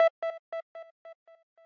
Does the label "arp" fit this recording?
Yes